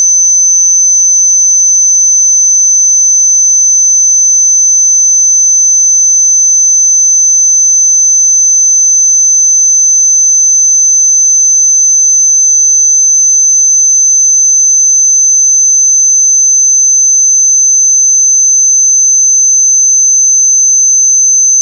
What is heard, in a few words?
SINE; SINE WAVEFORMS - LOG